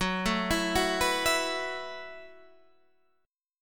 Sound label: F sharp Minor 11th